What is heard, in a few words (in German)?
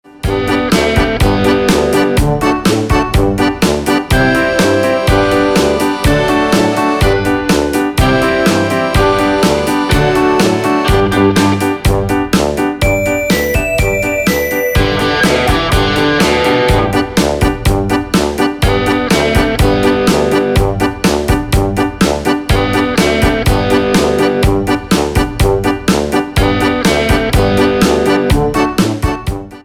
rirariesenrutsche karaoke